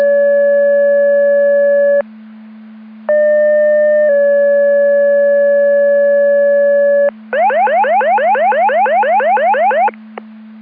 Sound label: Siren; Andover Twp Boro